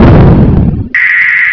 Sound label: fall; bunbun